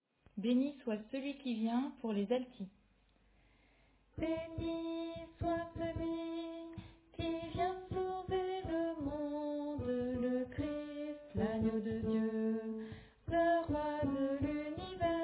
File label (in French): Alto